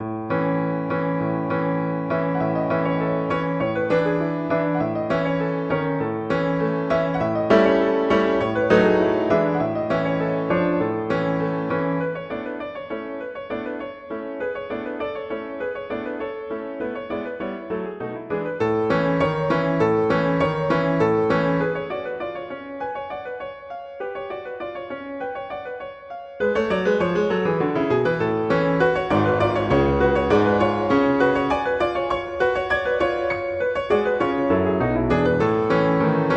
Halling; Grieg; Norwegian

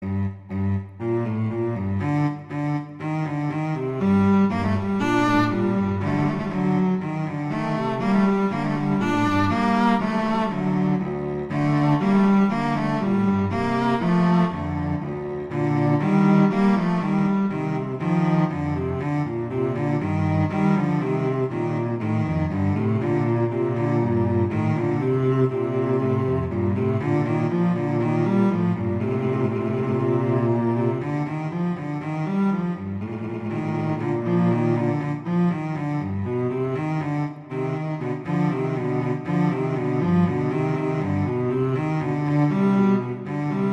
Piacevole non largo; Telemann; Canonic; Sonata 4

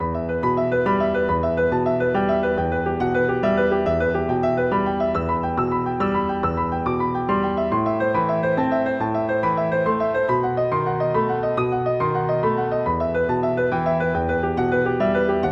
Spanish Romance; Anonymous; Jeux Interdits

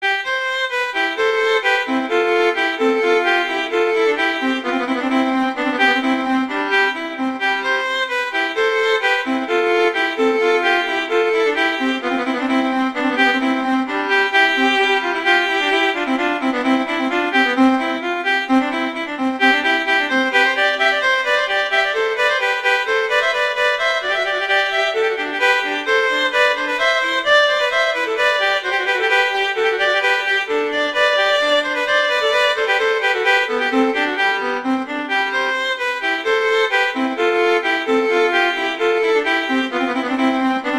Allegro; Telemann; Canonic; Sonata 1

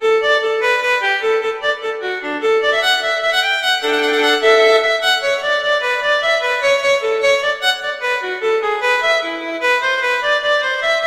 Gigue; Bach; Suite 1

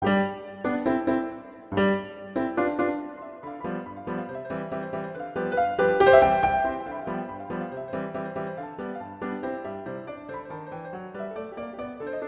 Pizzicato Polka; Strauss; Waltzes; Polkas

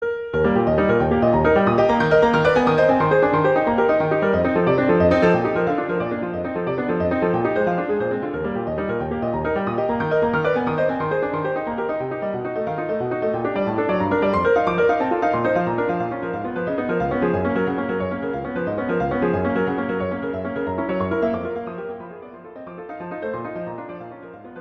Vivace, E flat major; Chopin; Preludes

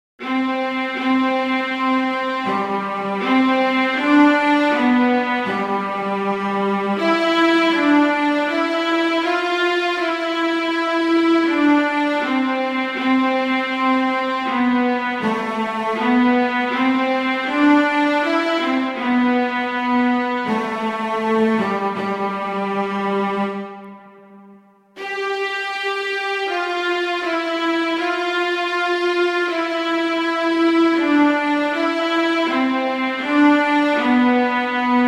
O Come All Ye Faithful - Adeste Fideles; Christmas Beginners

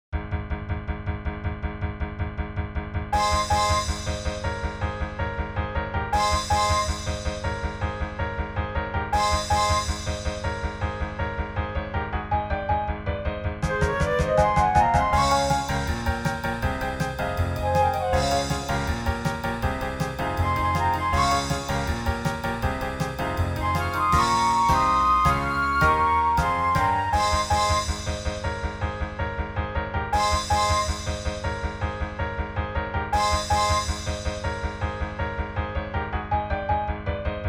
KARAOKE

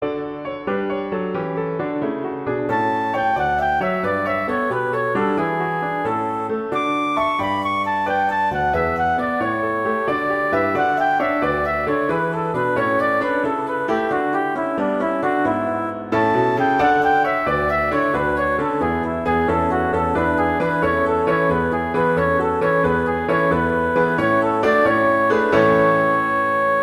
Gigue; Pachelbel; Canon

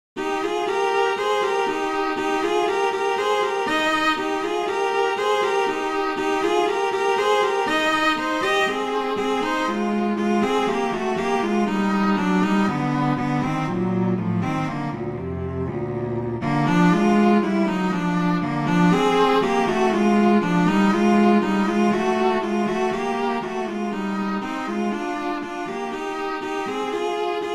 Andante Sostenuto; Popper

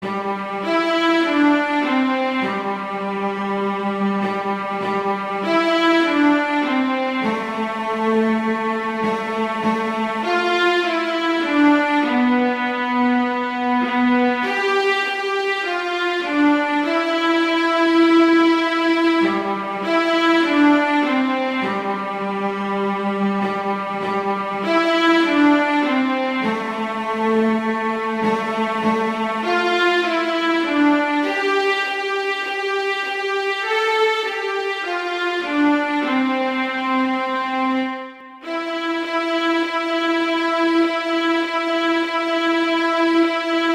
Jingle Bells; Christmas Beginners

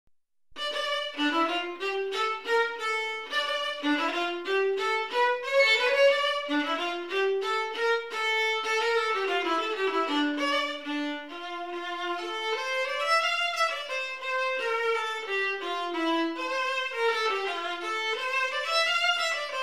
Melody, Violin; Fitzpatrick; Violin Melodies

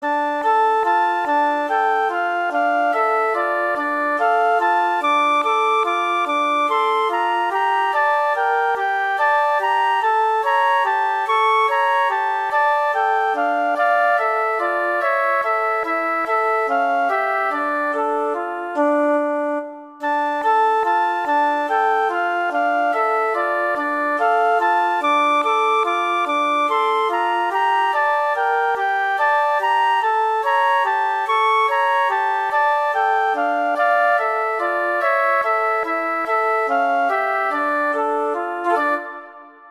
Jeux Interdits Spanish Romance, Fl; Valentine; Anonymous

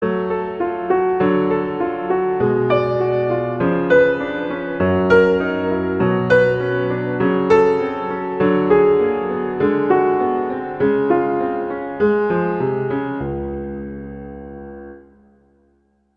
Prelude F sharp minor; Clementi; Preludes

